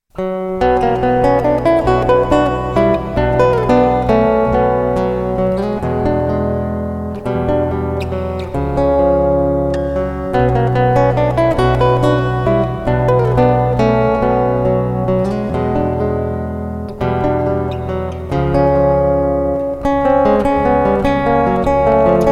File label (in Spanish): danza de anitra; grieg